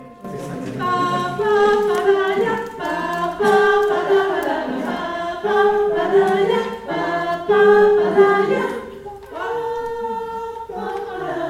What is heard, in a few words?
pa pa pa da iap sopranos